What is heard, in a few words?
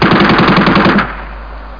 machine gun fire; warzone